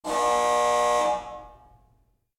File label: Alarm Decollage